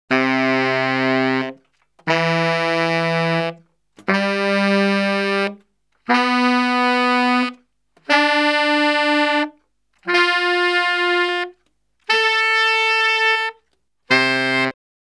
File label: Salsa Picante; Masterbits; World Zone VOL-3